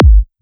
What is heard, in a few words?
Kick, Psytrance; Drum Shots